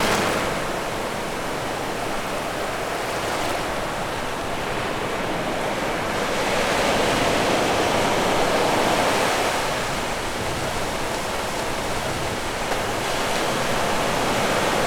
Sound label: Sounds of the Ocean, The Voice of the Ocean